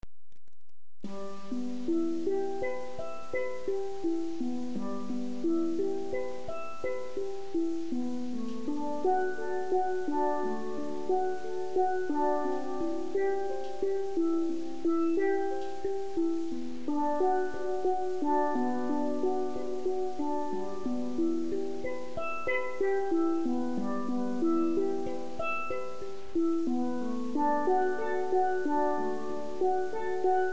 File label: double tenor, steel